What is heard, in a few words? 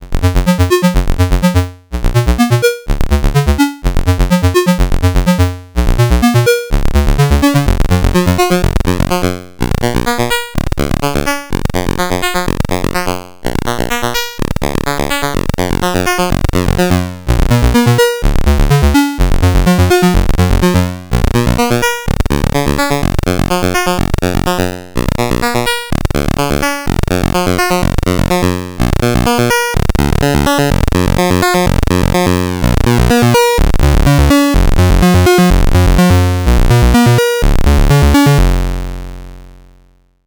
SQUARE PWM swept